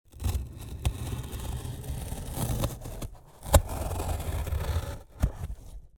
Cutting deep cardboard surface with a knife, slow movement, low frequency sound; Sound Ex Machina; SCRATCHES